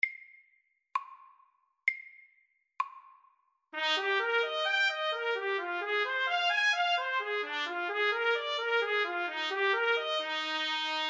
Akkord etyde MIDDELS

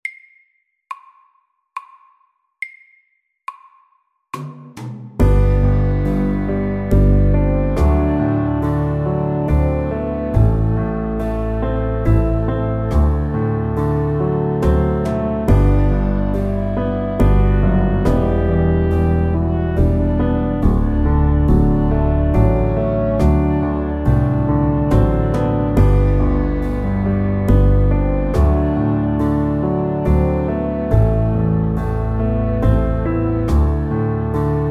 Soft rock SAKTE

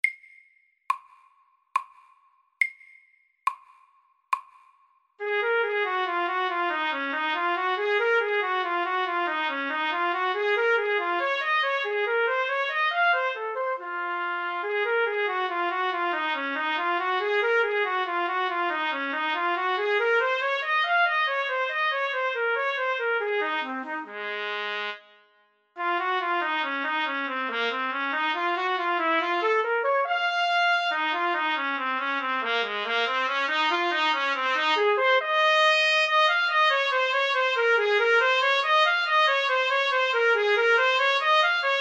Etyde i Ess dur SAKTE; Bohme

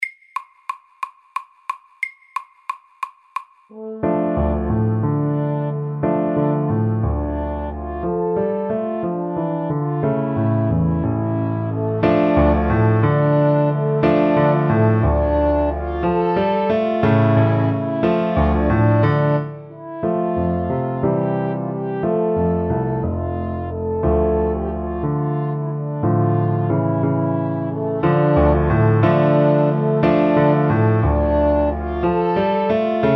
Bugle horn FORT

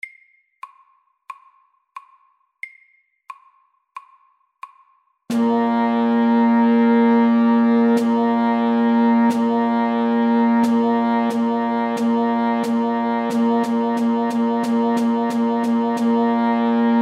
Rytme 3 slag i takten

